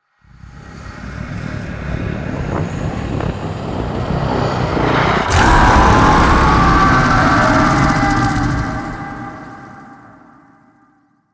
Howl; Warframe; Sacrifice; Sacrifice Shrine Intro

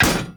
Hammer Impact Metal; Weapon Impacts; Player; Tuvalkane Hammer; Environment

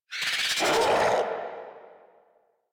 Infested Charger Alert, Enemies; Warframe

